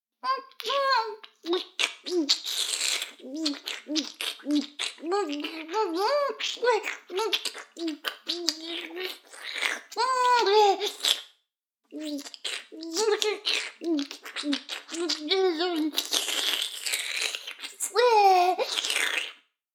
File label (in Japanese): フェラ音声; 逆時間停止されて